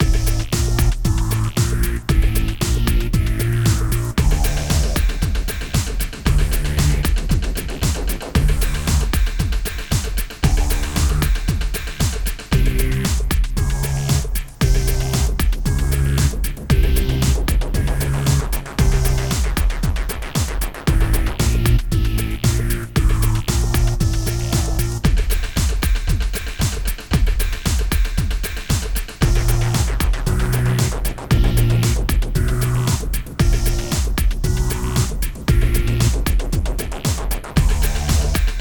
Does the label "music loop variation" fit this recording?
Yes